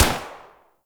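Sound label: Assault Rifle Fire Shot; Warframe; Weapons; Tenno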